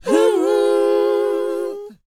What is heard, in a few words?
WHOA D#, Vocal, R&B & Dance; ILIO; Vocal Planet